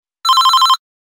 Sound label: Normal Ring Short, Business Rings